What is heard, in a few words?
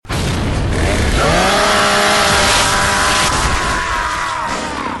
Chainsaw